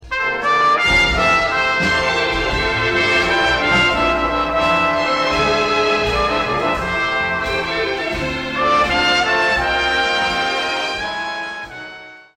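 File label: trumpet out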